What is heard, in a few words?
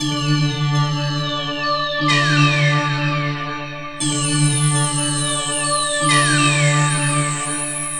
Breathing Flutes